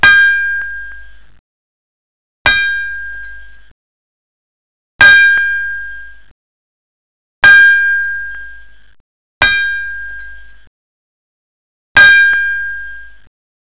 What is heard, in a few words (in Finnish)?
perkussio